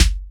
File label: trash snare; Greytsounds; Scott Peers Sound Engineering